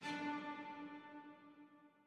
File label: chime old; chime sharp